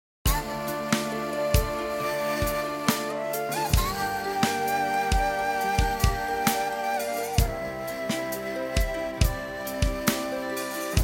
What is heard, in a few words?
ring; הוספתי טראבל והורדתי טיפה את הבס